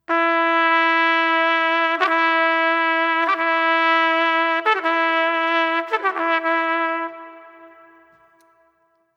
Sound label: Trumpet Solo; труба